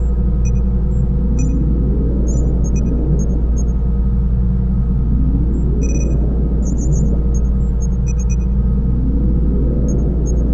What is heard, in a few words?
Sci Fi Laboratory Ambience